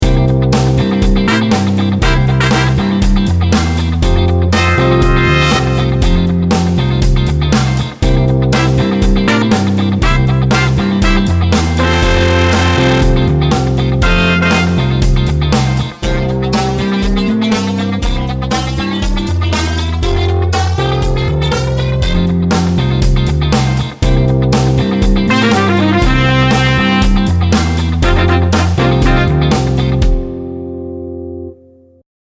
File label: Session Horns